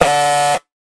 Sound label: industrial alarm; pyledriver